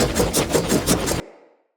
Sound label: Short Sword Throw Loop; Weapons; Player